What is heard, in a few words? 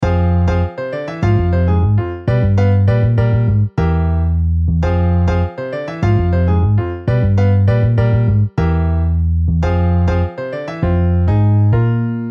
Piano Bass